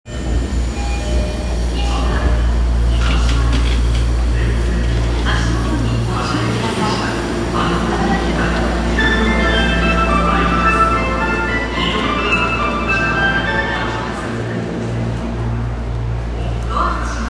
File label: train sounds; 有楽町線月島東